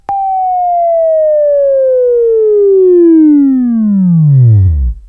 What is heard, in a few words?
Buzz, Sweeping Training